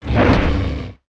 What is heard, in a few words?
Zombie Atk